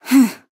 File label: Nonverbal Determined; Aurora